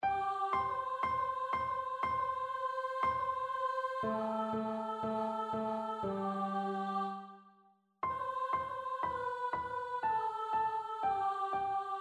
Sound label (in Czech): lesni solo